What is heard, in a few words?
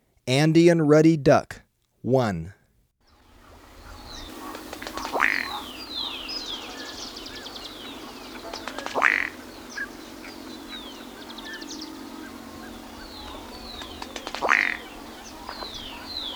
Andean Ruddy Duck, Birds of Ecuador; S. America